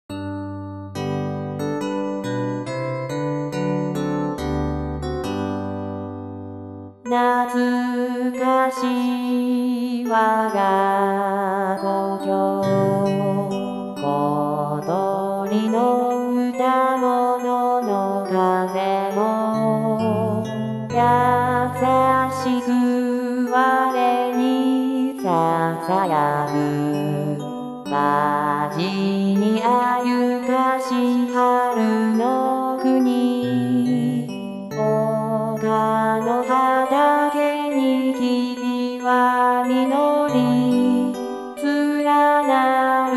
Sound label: chor-t